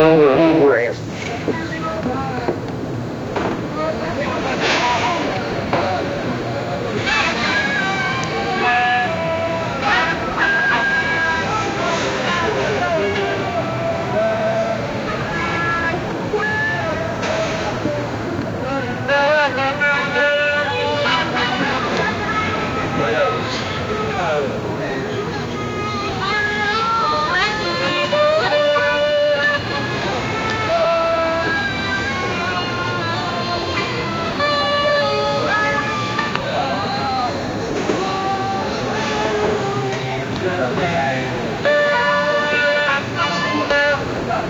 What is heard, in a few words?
hammer sound breaking; auditory